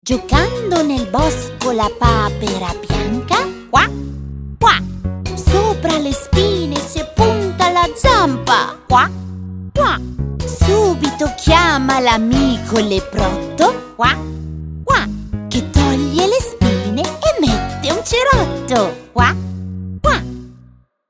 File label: Musical Walking Mode Selection Song; ITA